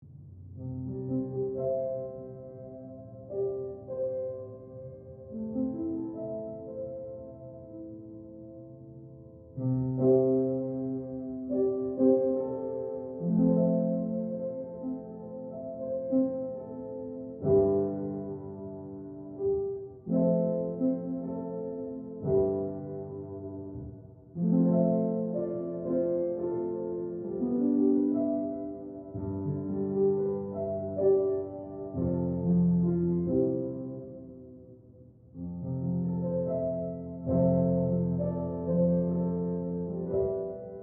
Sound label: Piano Distant